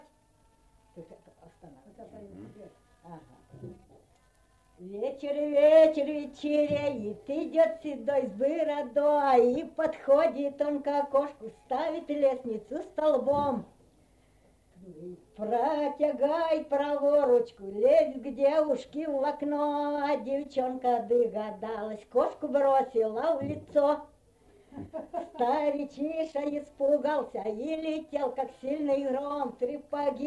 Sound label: chastushki